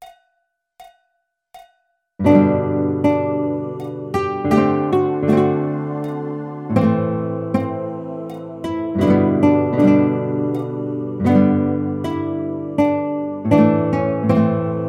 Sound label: Guitar